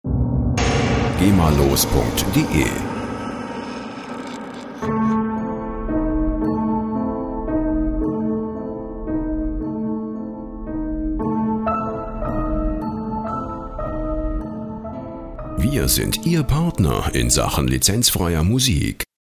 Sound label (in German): grusel klavier